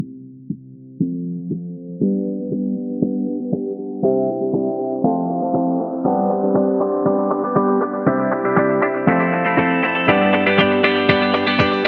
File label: Uplifting; corporate promo